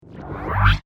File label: Quick Round Whoosh; Whoosh Sound Effects